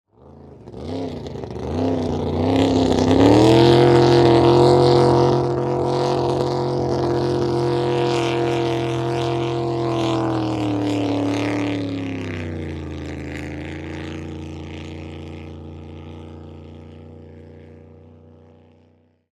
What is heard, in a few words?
Hydroplane Away, Watercraft, Take, Off; Transportation, Watercraft, Hydroplane, Take, Off, Away; Boats; BlastWave